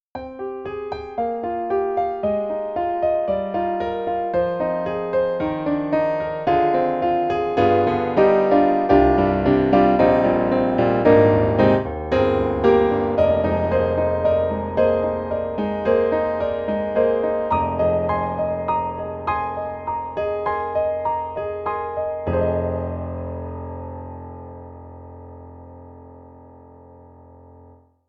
Roland SRX-11 Superior Grd (XV-5050)

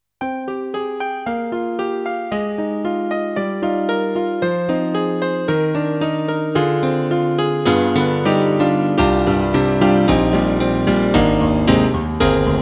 KORG N1R M1 Piano

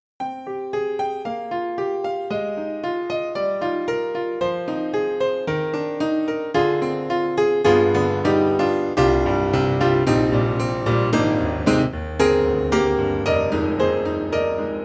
KORG KRONOS 2 Piano

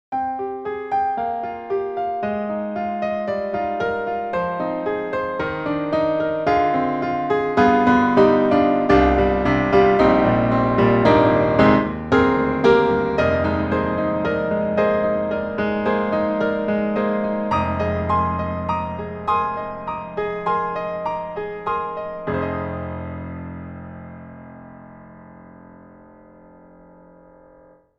Grand Pianos Bosendorfer Concert Bosendorfer; Synthogy; IvoryII

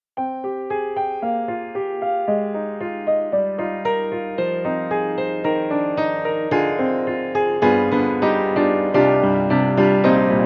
Upright Piano Underground Tunnel; Steinberg; The Grand 3

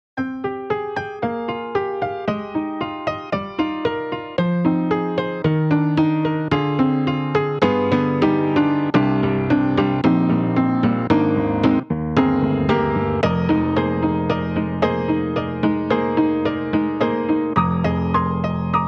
Modern Upright, Funky Upright; XLN Audio; Addictive Keys

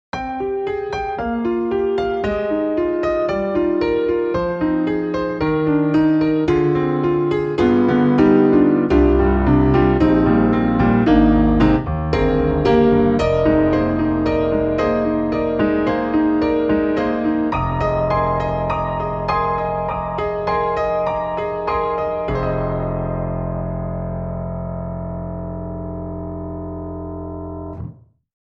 Grand Piano Red Piano; Waves; Grand Rhapsody Piano

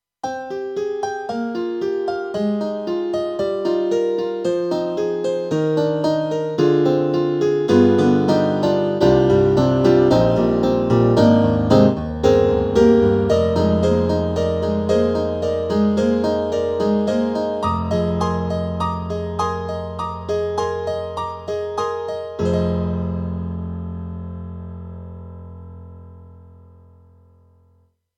AKAI SG01p, HOLY PIANO

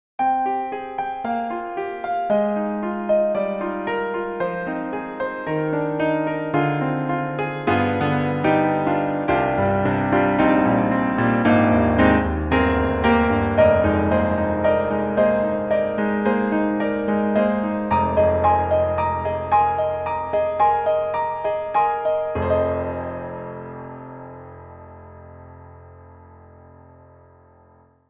Roland Juno-Gi 88 Key Grand

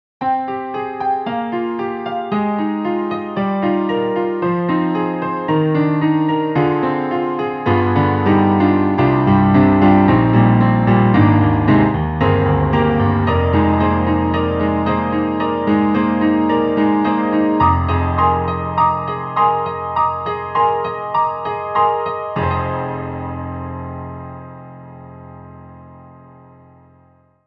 Old Vinyl Piano

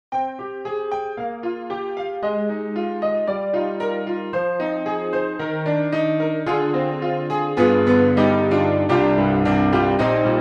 Bright Honky Tonk; Hybrid

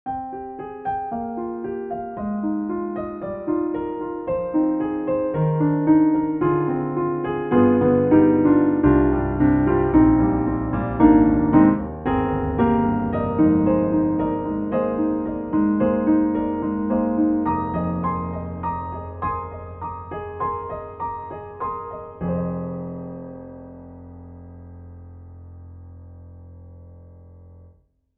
Italian Grand Pianissimo; Synthogy; Ivory II

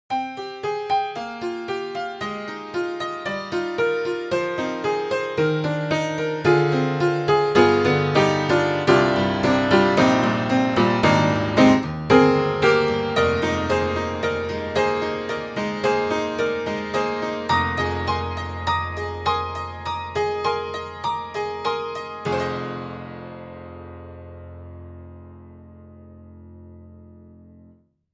Tack Piano Tinny Tack; Upright Pianos; Synthogy; Ivory II

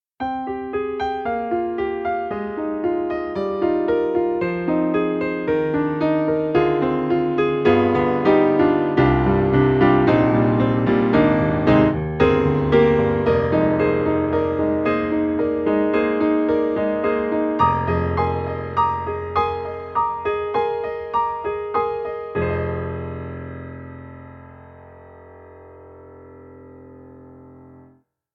American Grand, Mix Mic; VI Labs; True Keys

